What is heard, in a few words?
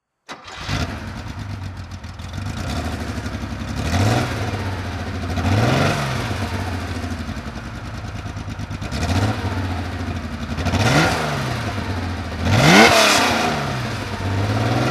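porsche 911 22T rally throttle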